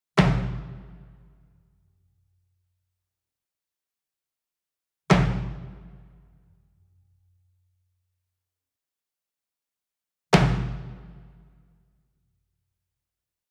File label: SINGLE DRUM Bass Drum Marching Hit Hard Beater Medium; boom library; Cinematic Strikes Construction Kit